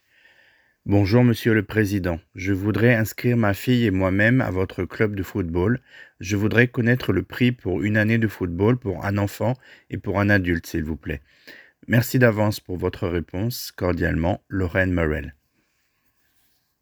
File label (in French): voix